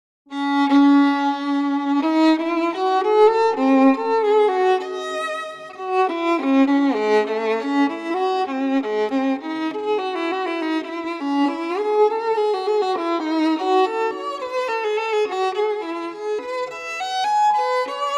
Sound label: violin